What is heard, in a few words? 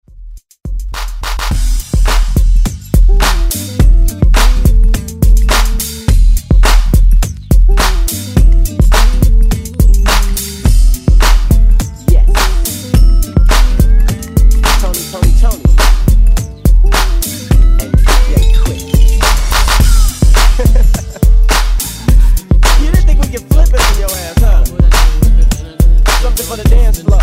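90s Extended Redrum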